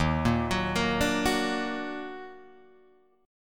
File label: Eb Minor Major 7th Eb down Guitar Open G, chord